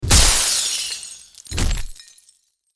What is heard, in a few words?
Bodyfall Through Glass